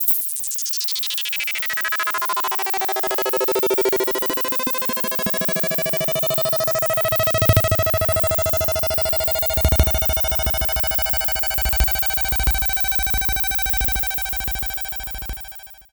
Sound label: ELECTRIC, Special FX Twisted; USB Soundscan vol.14; AKAI